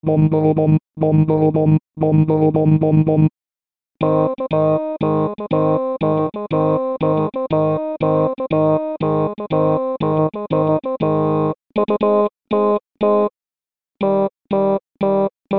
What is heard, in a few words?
Fanfare